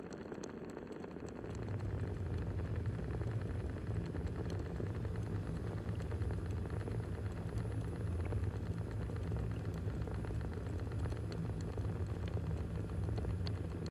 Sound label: Basement Water Heater